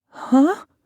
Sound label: huh; teruteru